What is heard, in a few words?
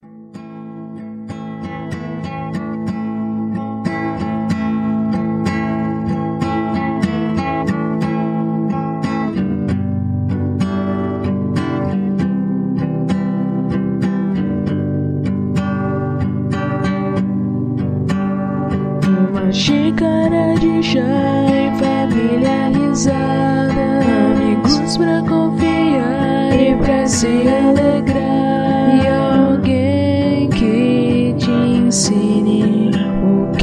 folk